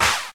modern clap one shot g key; single hits one shots

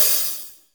sizzle open high hat sample c sharp key

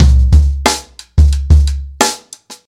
120 bpm drum loop sample c key

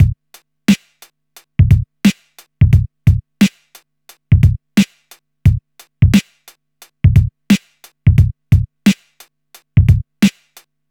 88 bpm drum groove f sharp key

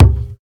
original kick drum c sharp key

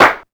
sharp clap single shot f key; percussion; single hits one shots

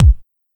reverb bass drum one shot b key

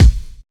thick kick drum a sharp key; kick drum samples

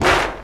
clap e key; percussion; single hits one shots

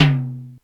high floor tom sound d key

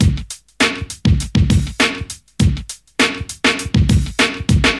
100 bpm drum groove f key

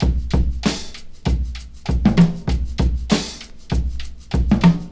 97 bpm drum groove b key; drum loops

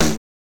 2000s solid acoustic snare sample d sharp key

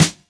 clean snare e key, Loudest frequency: 2517Hz

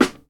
good snare drum f sharp key

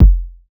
hot steel kick drum f key; kick drum samples

